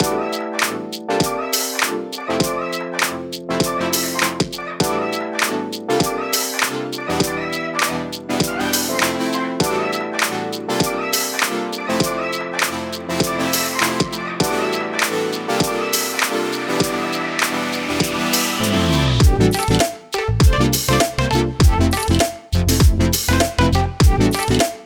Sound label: Future Funk